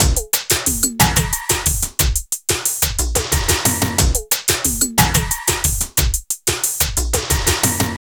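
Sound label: drum loops, Right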